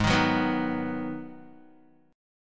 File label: Ab Minor 7th Ab, Guitar; strummed; 4F,4A,5C,5G,5C,6E; 3,6,6,4,3,x